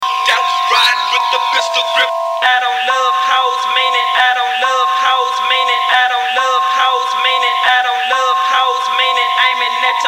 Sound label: pistol grip; smoked out